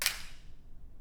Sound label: hall impulse; 講堂5; プロジェクトA